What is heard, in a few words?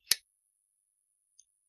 the neon lamp lights up